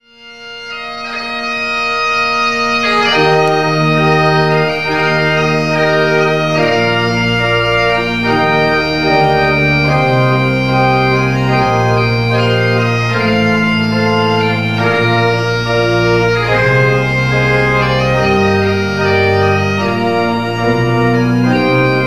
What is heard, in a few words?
Duet With Organ, bagpiper